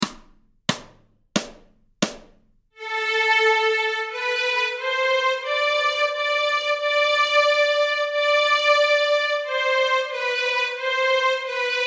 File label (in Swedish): Stråk Amoll, Fiol; Höstmåne; Notträning